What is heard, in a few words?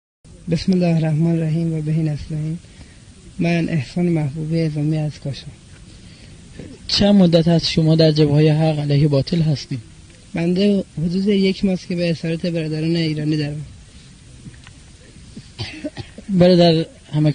warriors, رزمندگان در جبهه